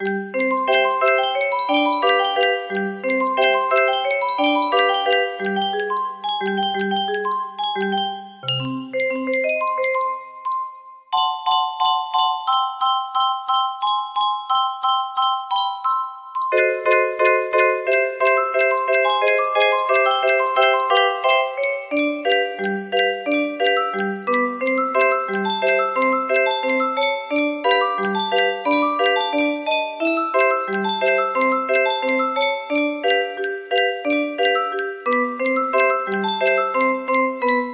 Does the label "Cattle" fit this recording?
No